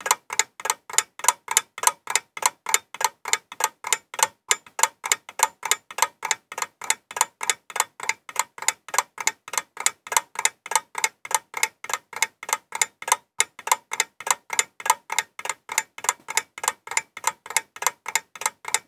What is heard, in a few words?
CLOCK Tick Tic tac de reveil mecanique